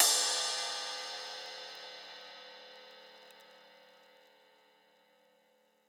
ride; eddyflux

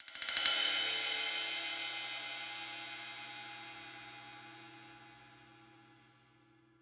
ride; eddyflux

